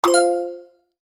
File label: match pair